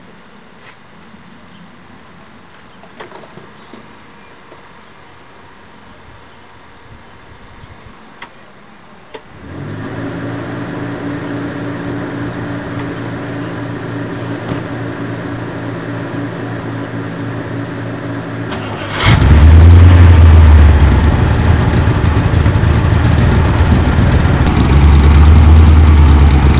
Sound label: pump fan v8; v8 mini